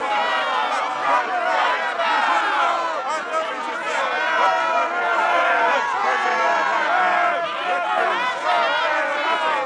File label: Protest; disasters